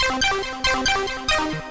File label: 140 8bit game melody looper; SAMPLED MUSIC LOOPS